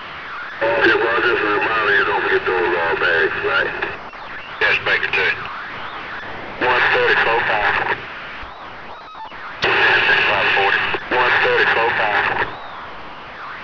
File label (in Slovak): vysielacka